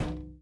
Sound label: Footstep Glass